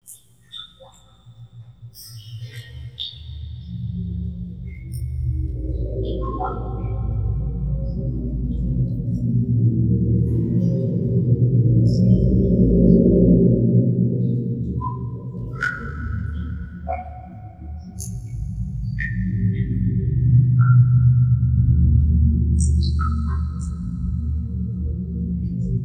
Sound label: Cave; Sonic Foundry (Sony Creative Software); World Pop